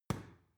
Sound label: boxing paw hit soft; boxen